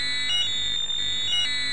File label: hardcore hipitched loop; LOOPING AMBIENCE